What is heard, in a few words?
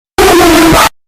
jumpscare sound; fnas 2 reimagined